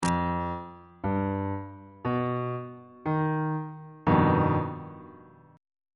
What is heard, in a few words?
g augmented 7th 3rd inversion